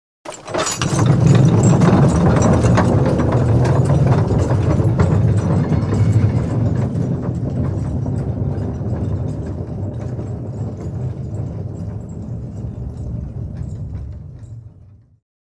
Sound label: Away Pit Pony Tub Star, carriages